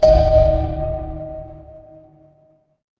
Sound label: door doorbell